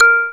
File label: A#, Synclav FM PERCUSSION; Big Fish Audio